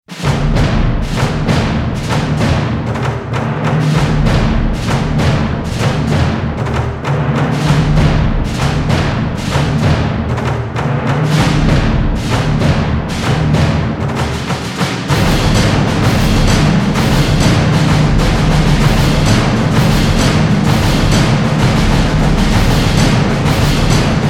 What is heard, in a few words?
drums fx